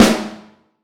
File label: Vintage Snare Drum, 6.5X14; Q Up Arts; Johnny.C's Vintage Snare Drum; 6.5X14 LUD